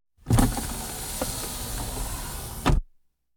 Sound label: Automobile Window Closing Electrically; KMP Film Video Sound Effects